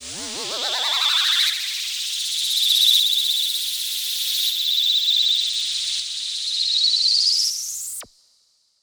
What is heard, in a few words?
Force Riser